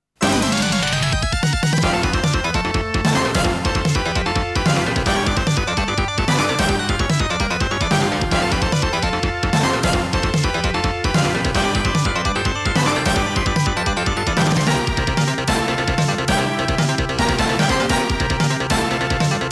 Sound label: 3D Stage BGM